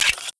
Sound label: clip out, weapons; czero opforce